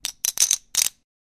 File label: Poker chips stack quickly